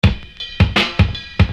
Beat, Hip-Hop Street Beats

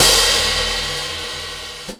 Open Ball Hi-Hat; KJ Sawka; Drum n Bass & Beyond; Drum Tool Box

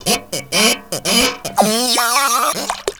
TINY ROBOT; ENVIRN-AMB

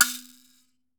MBIRA B3, Kalimba; World O' Kalimba

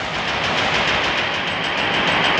Delay Stack, 100bpm; rhythmic inspiration

